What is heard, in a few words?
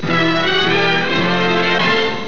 drown; quake2; starscream